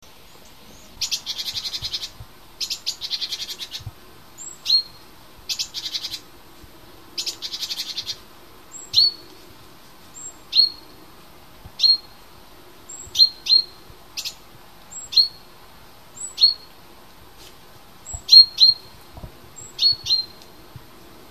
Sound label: parus major; vogel; hecke; biologie